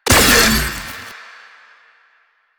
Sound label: Verglas Prime Shot, weapons; DE; Warframe; Sentinel